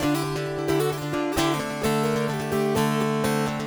Dulcimer; Stringed Instruments

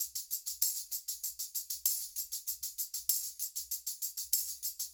Tambourine, acoustic percussion loop; Drums & Percussion; Percussion Loops

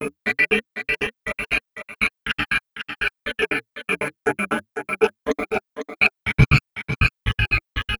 Electronica Drumloop

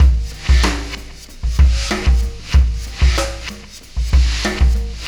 Acoustic Drumloop

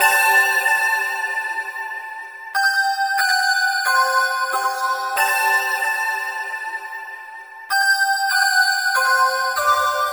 Synth

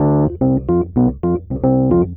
E. Piano; Keys

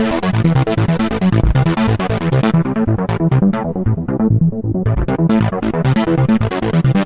Synth Bass

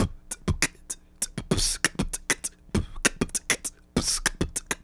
Hip-Hop Drumloop; Drums & Percussion

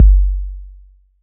Kick, Drums & Percussion One Shots Drums

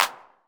Clap; Drums & Percussion; One Shots Drums

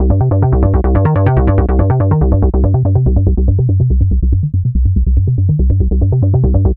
Synth Bass

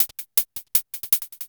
Dance Drumloop

